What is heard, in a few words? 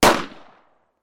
colt45